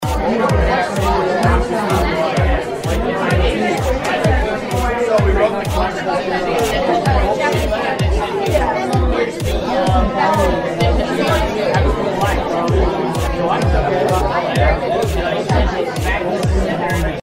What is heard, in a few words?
party sound